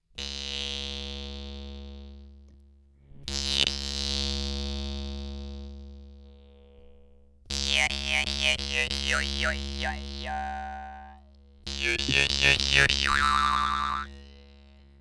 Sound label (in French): fantome do3, guimbardes